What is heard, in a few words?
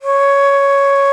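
alto bansuri, World Winds; E-MU Producer Series Vol. 7 – Old World Instruments; World Combos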